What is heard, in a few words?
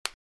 click